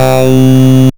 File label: Bass C, Offbeat Bass